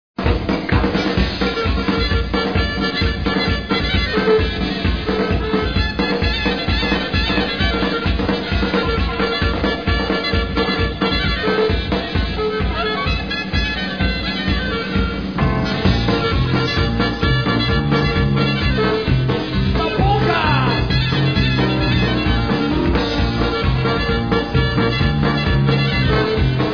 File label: Clarinet Polka